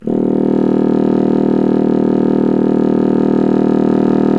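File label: wind contrabassoon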